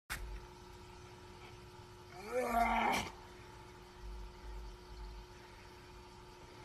dog noise

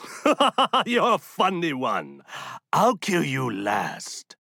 laugh

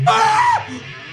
scream

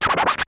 cancel; jet set radio

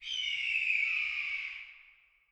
eagle; cowboy; cree; MOREE SKREEE

